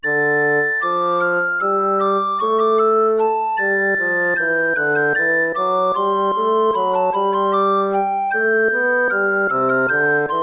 air, hornpipe

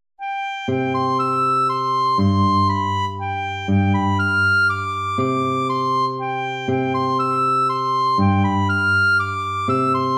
waltz; Carulli Ferdinando

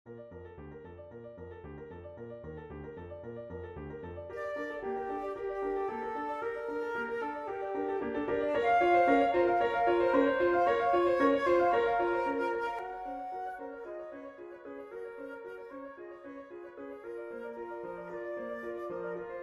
for flute piano; dvorak antonin bache from lieder

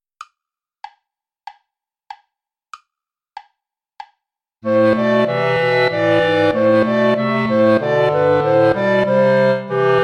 play along without clarinet

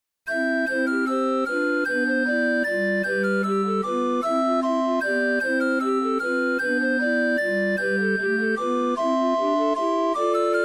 articulations major scales for alto recorder; grall doris

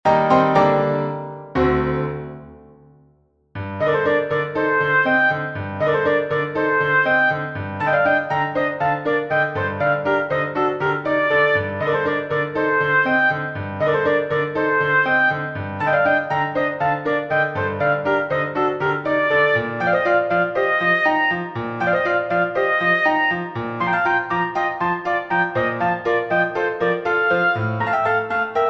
oboe piano

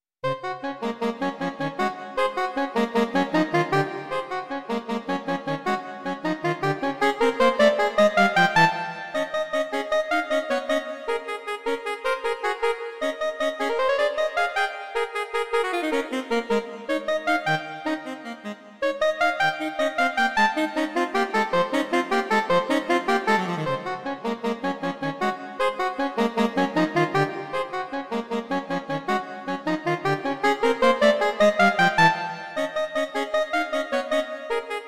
bagatelles vivo

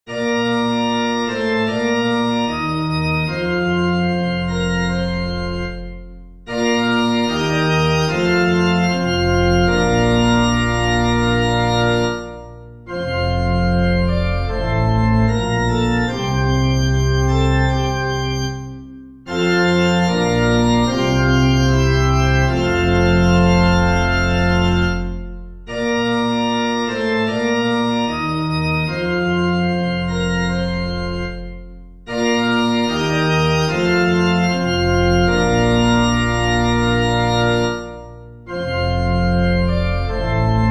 chorale for pipe organ; bach johann sebastian; weide mich und mach mich satt

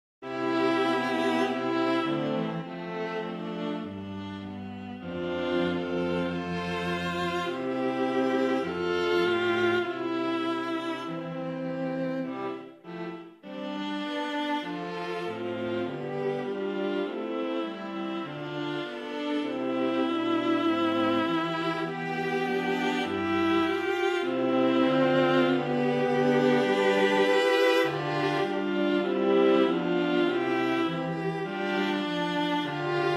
string quartet major

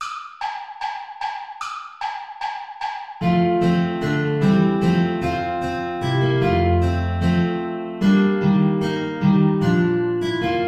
ballet play along without flute; praetorius michael

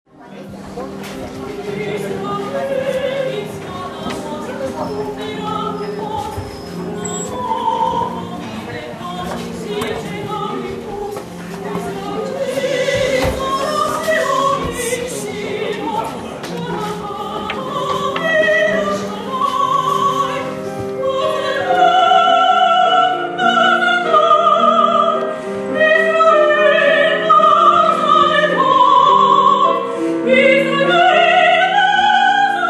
minor version; mazal tov israel; version mineur

